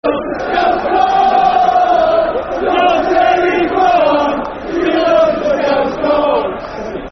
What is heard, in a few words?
Football Chants (Soccer Songs), Liverpool